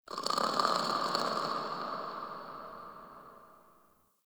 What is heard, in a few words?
Creature Distant Low; Ambience; Misc Outdoor; Warframe; Infested Eris